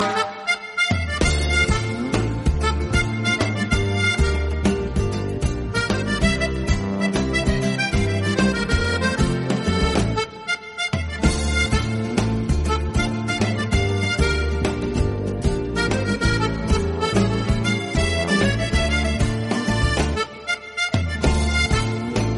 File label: light dance music mobile phone tone ringtone